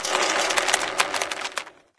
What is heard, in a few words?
bridge rope squeak; random amb sfx